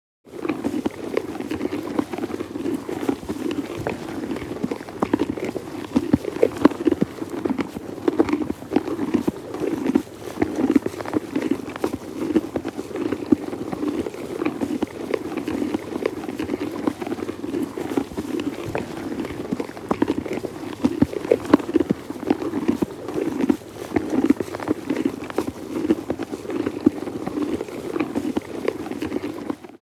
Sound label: Donkeys feeding eating hay in stables